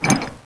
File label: wrench miss; weapons